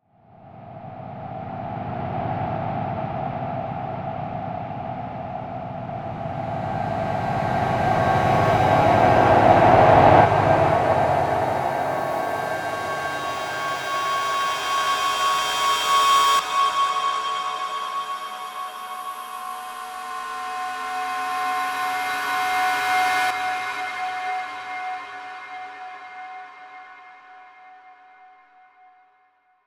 Eerie Feedback Swells; Warped; Blastwave

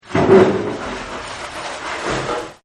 Hit Shatter; Objects

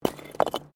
Bricks Movement; Material components for constructing more complex sounds; Rocks; Materials

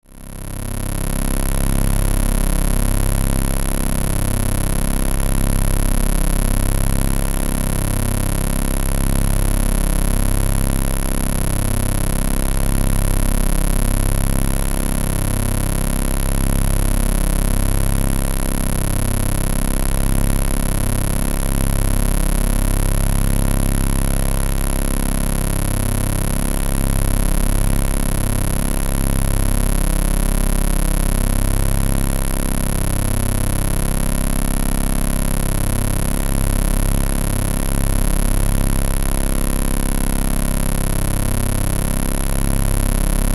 Bed Electric Power Source; Bed Sound Effects

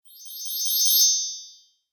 Naughty Fairy Magic Flutter Spell

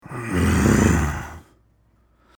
grunt male, Man Grunts; Guttural; Voice

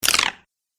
Arcade Game Slow Wind Up Sound; Video Game Sound Effects; Retro Inspired Sound Effects - Think Nintendo, Atari, C64, Etc.

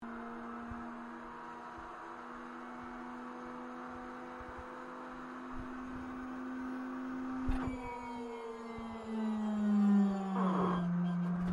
AIRCRAFT AT-6D HARVARD MK III STARTUP INTERIOR; Harvard Training Aricraft; Airplanes; Vehicles

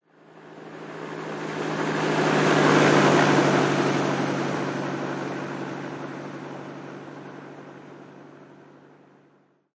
Helicopter Fly Passby Fast

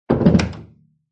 Domestic Door Wood Door Close; Doors; Domestic Door, Wood Door Close 02; Miscellaneous Doors; Lynne Music

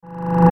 Mouseover Clicks Reverse Delay; Multimedia; Blastwave

